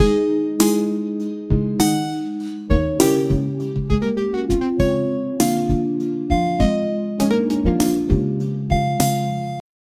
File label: light playful tune